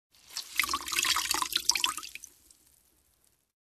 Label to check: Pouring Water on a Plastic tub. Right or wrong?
Right